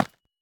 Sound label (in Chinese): 方解石放置声